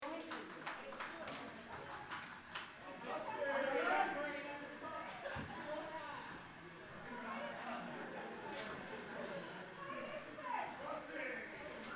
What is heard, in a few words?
ringtone